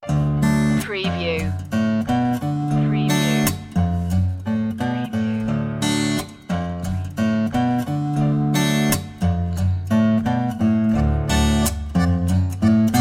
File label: loosy bluesy underscore